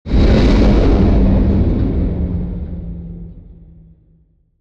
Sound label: Explosion